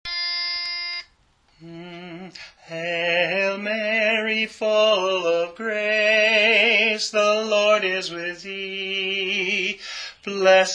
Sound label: Lead; Hail Mary